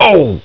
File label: pain; duke